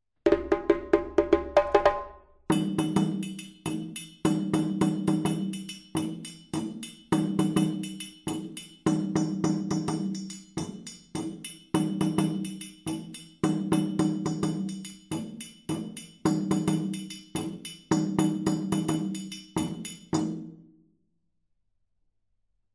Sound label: Dallah Dununs, djembe